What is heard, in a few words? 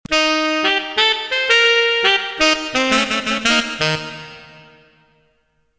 saxophone